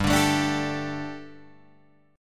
G Suspended 2nd, Guitar, Drop D; chordshape; down

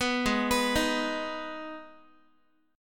Ab Minor Ab, Guitar; arpeggiated; 14,16,14,13,x,x; 4A,4E,5A,5D,5Gsharp,6Csharp

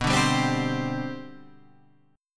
C Suspended 2nd, B, guitar chord; C2/B strummed

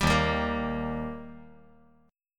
Eb Major Eb down 5G,4C,5E,5A; strummed; JGuitar

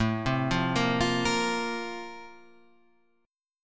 A sharp Major 7th Suspended 2nd A

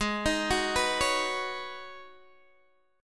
G sharp Minor 11th G sharp Guitar Open G, chord

